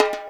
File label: REPIKE, Percussion; InVision Interactive